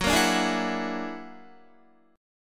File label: Gb Augmented 9th Gb down Guitar 4F,4A,5C,5G,5C,6E; Listen to Gb+9 strummed